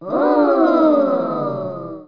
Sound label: oooh; Toy Story Activity Center (Disney-Pixar)